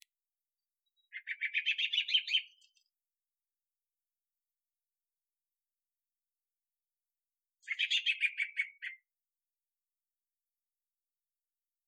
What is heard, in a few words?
birds sounds